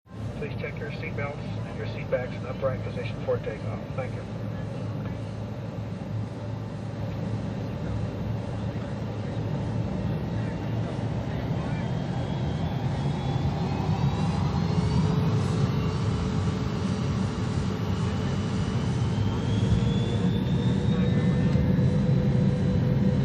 Boeing 727, interior, takeoff sequence from announcement to lift off & flight